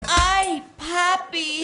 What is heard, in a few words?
cut elevator haunted